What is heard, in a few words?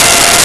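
Soprano Sax F5; Nintendo; July 2020 Gigaleak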